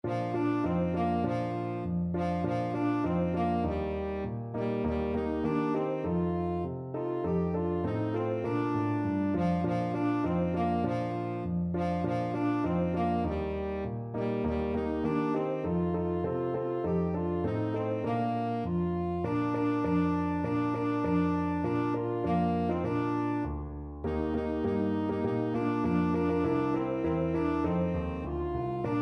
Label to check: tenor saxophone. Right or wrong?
Right